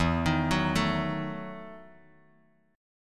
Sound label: E Major 7th Suspended 2nd Suspended 4th, Bass Standard; EM7sus2sus4 arpeggiated